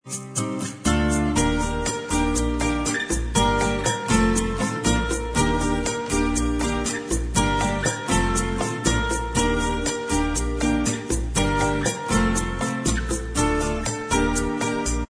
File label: bossa, Inst